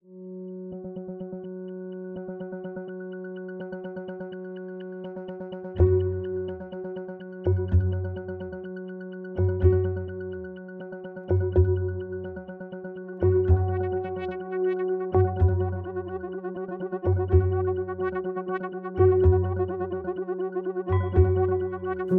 Menu Music; The Farmer Was Replaced

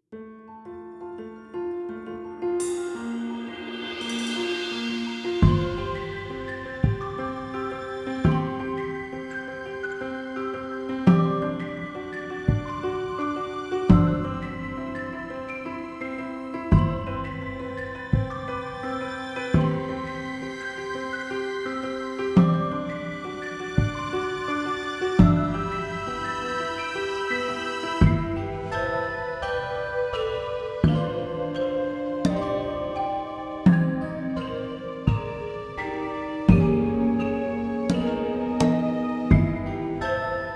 Dungeon Ice

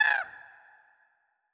Vulture; Legend of Keepers

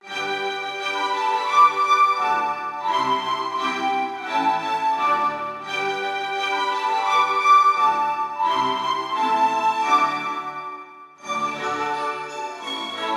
The Elevator, Classical Music; rayman raving rabbids playstation 2

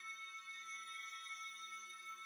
Dream shield Loop; gamerip

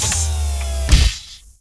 Fsdoor

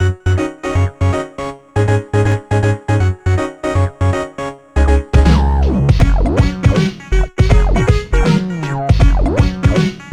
Matsuri Bpm80; gamerip; Mojib-Ribbon; PS2

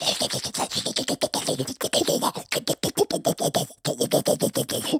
Aggressive Loop

